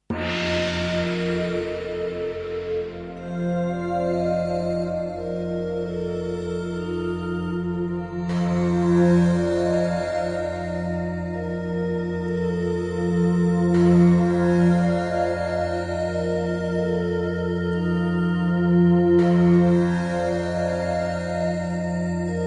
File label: Prelude